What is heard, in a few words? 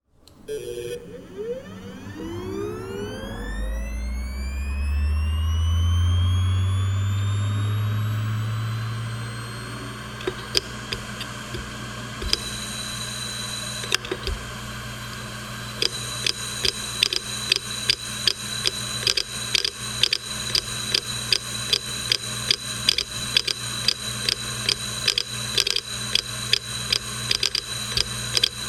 Hitachi Desktstar HDT725040VLAT80 Head Crash